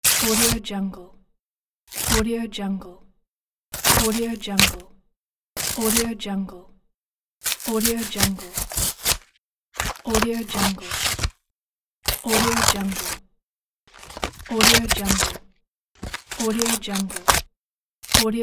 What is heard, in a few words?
tear the thick paper